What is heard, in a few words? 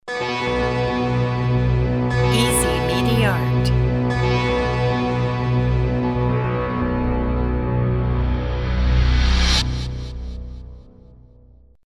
audio logo attention